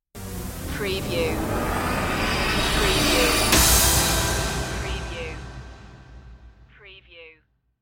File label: tv punch stinger